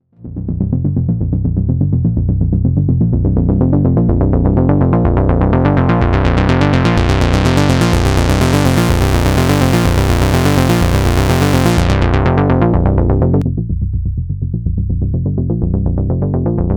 Alle drei Filter hintereinander gesweeped

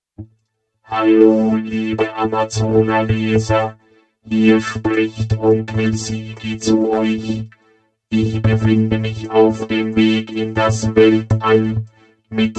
Vocoder Effekt

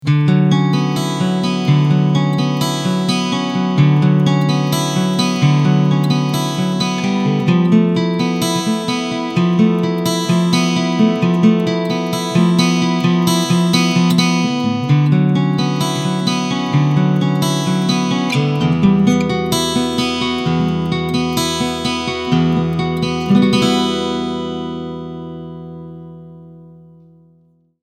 Clean Picking Mikro

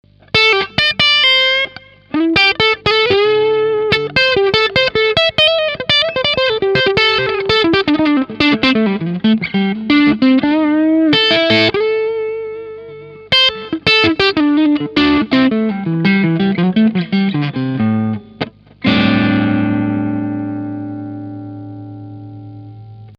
Maybach Teleman T61 Hals crunchy

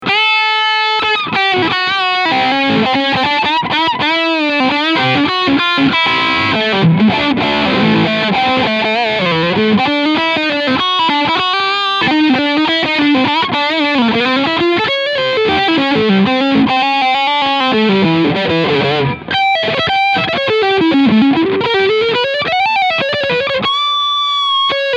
Cort G 290 LE Leadsound Steg Humbucker

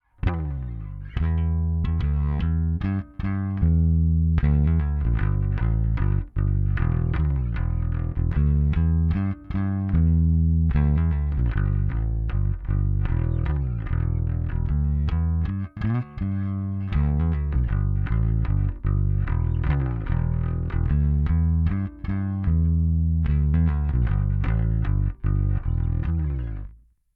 kombi Amp DI

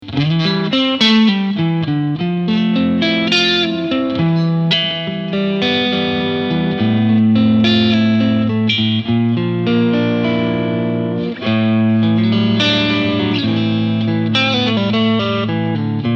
Crunch Tone 14 Uhr Reverb

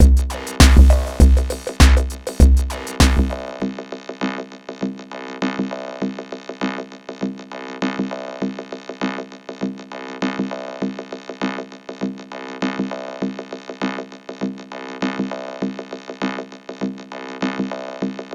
vocoder drums einfach

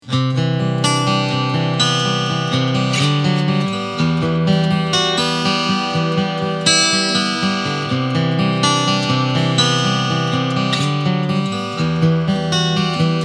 Picking Steg

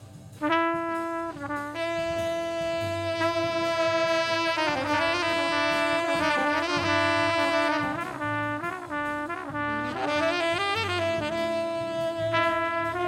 Trompete SM58 und Saxophon SM57; FMR Audio RNP 8380

